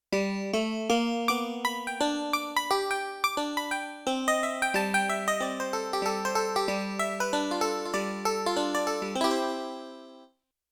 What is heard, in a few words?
Banjo & E Piano; Yamaha TX16W; Jazz Performance; Jazz Performance 2